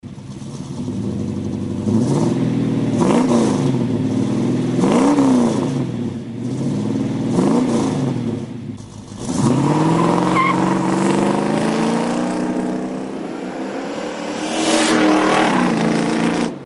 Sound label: magnaflow exhaust systems